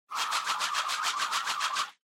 Motion Swish Mid Long, 「ヒュン」「ブン」「シュ」といった、スイング音・風を切る音のSEです